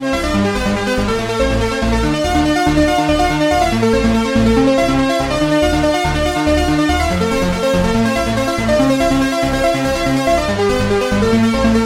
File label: Oscillator LEAD Trance Formant; Alphatron; Syntheway